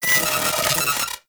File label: Cipher Fail, UI